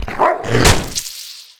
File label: bite release; Apotheorasis